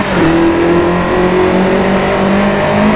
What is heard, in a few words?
third, vehicles; gmod; tdmcars; vw golf gti 14